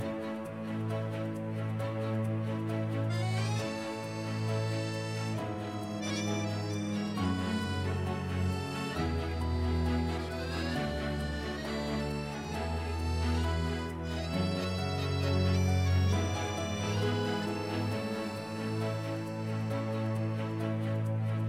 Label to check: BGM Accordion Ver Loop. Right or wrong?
Right